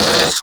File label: Combat Vocal Pain; Warframe; Catbrow